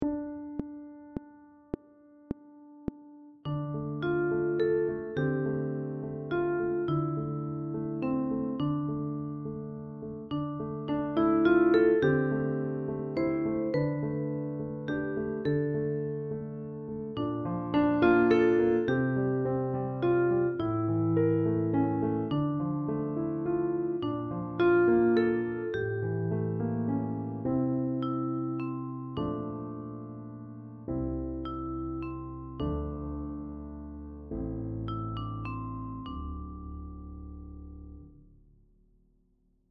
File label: Piano Vocaline